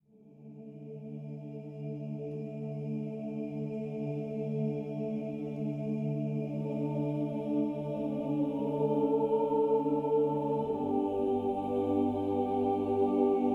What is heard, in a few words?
World Music